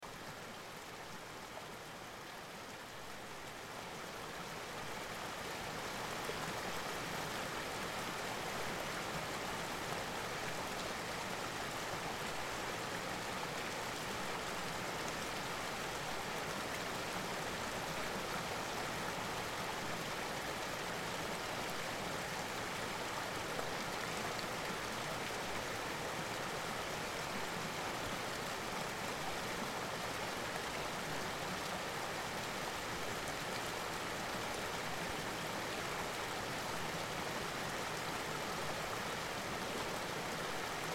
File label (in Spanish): Flumen Salas Salto